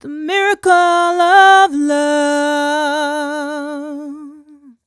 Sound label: f mel d, fem Vocals